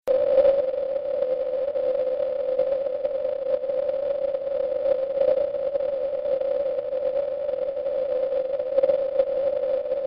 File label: CW; OK2PPP 6.48SELC; SuperQRPtest